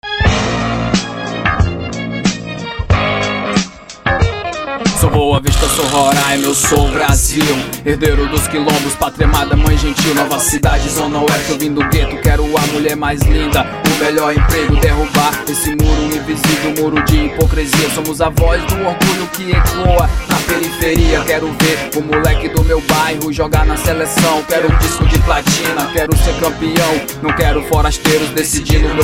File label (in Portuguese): forro